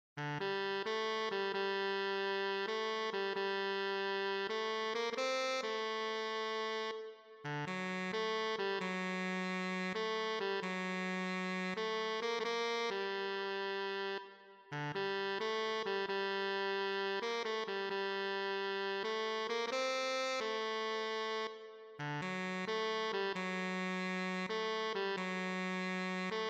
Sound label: tenor saxophone